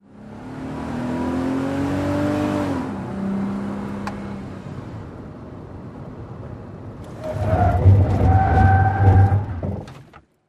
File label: SUV Trk Accel Skid